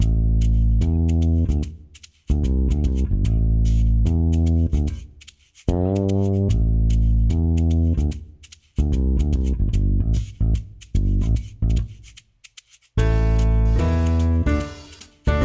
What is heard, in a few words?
Draw BGM; BTS World